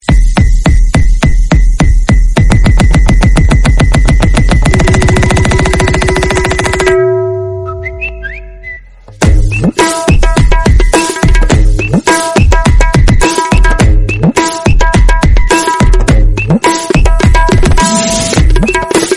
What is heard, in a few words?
iPhone 15 Tabla; iphone 15 pro max